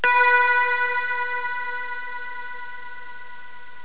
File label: DING